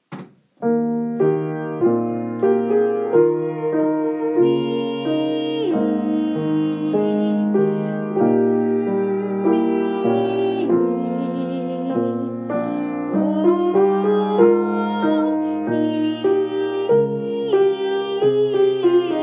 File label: vocalise with melody; Grade 1